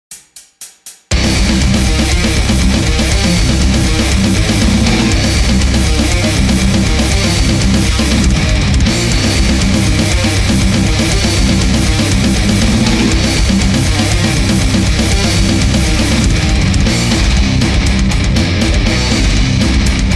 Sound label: bass